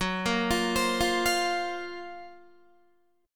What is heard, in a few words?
F sharp Major Sharp 11th F sharp, Guitar; F#M#11 Chord; arpeggiated; 13,13,17,17,17,13